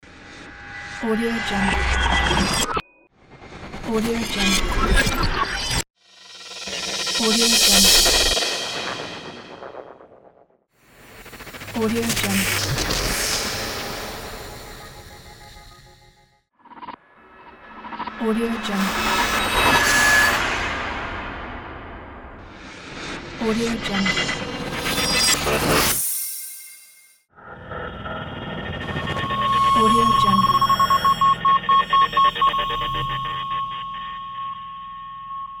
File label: cinematic epic sound